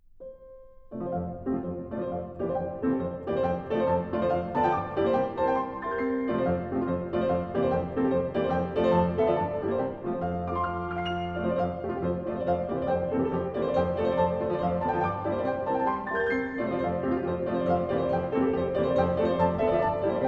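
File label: Op 25, No 3 - F major, Etudes, Piano; Chopin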